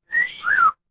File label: cartoon slide whistle